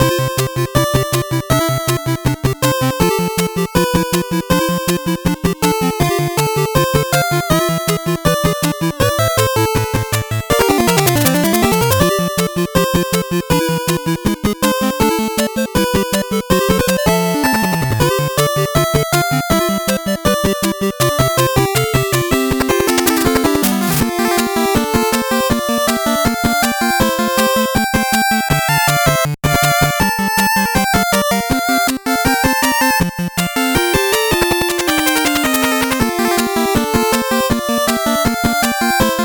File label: 8bit bgm fast